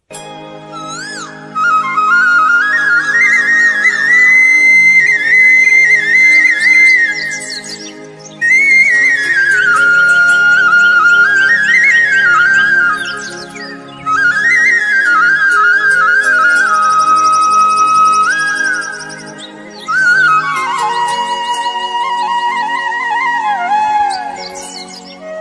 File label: koyal bird ringtone